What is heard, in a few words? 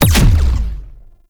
Alt Fire Punch, Weapons; Warframe; Corpus; Tenet Quanta